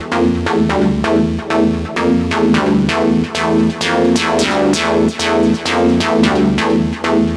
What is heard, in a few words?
Synth Loop 130 F, Techno; Loops; Synths; GhostHack; Ghosthack - Free Techno Sounds 2024